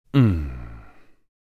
Relaxed man gentle moan

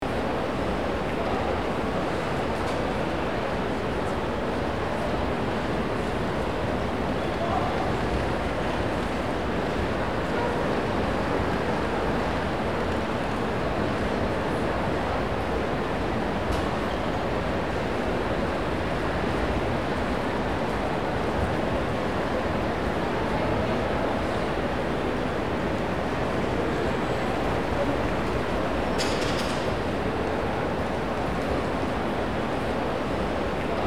Train platform empty distant activity; station

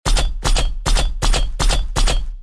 Robot organic cyborg footsteps running

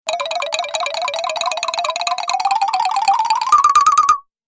Cartoon running movement funny

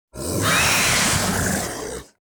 Young dragon roar; fantasy

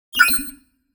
Futuristic tone authorization confirm

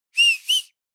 Human whistle short fingers call attention

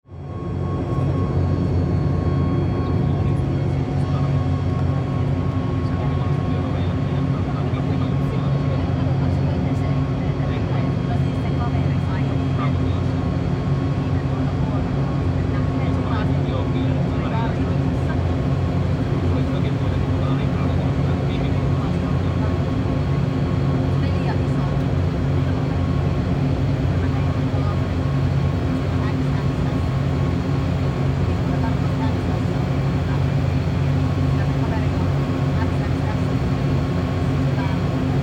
Propeller airplane cabin interior

